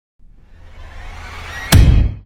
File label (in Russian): секунды щелчок; Звуки для монтажа